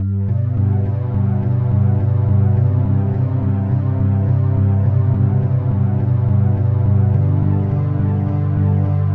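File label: Himalayan Peace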